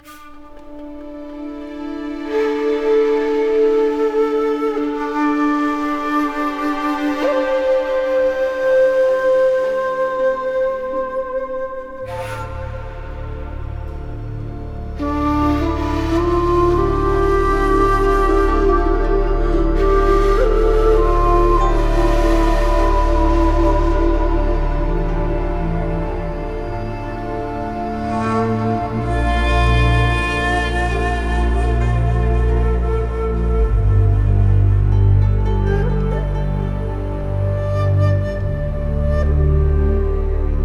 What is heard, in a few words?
Explore 100BPM